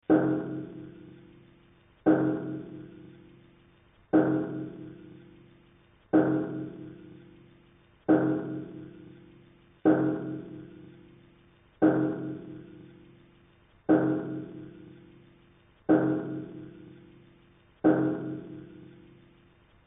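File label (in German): Sound U435 mehrfach ungedämmt, Unimog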